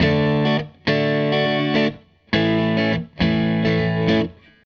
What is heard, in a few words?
Gretsch Guitar Loop